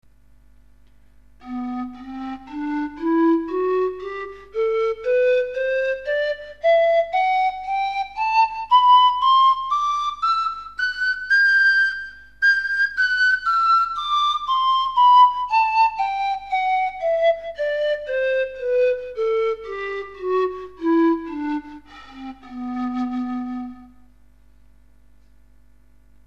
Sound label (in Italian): baritono